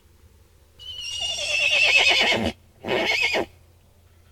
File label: Neigh